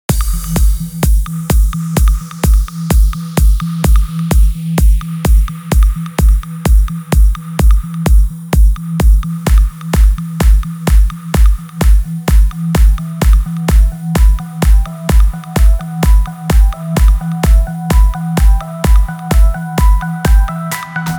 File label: Big room house